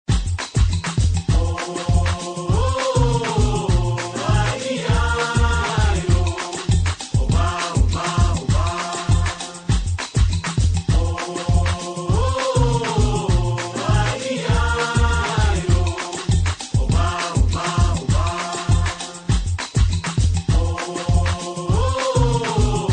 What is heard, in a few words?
brasil soccer music